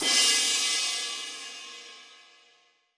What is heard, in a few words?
Cym Slo Crash, Alesis D4